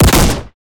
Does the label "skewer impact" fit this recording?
Yes